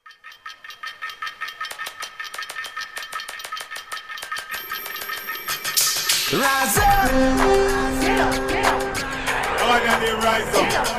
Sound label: Wake Up Call Club